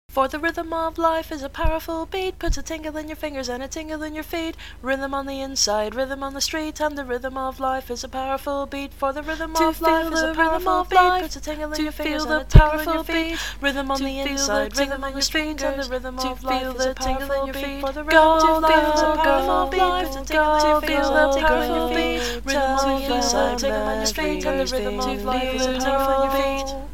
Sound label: rhythm main chorus